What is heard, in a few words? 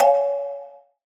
coin Sound